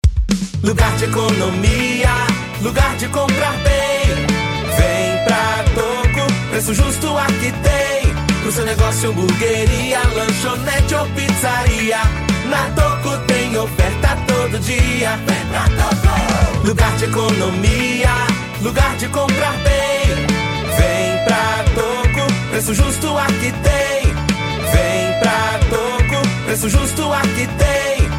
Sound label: JINGLE; TOKYO